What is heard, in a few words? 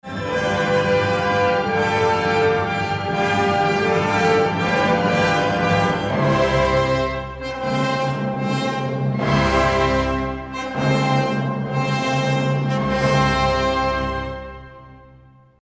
Drum roll